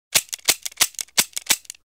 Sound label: gun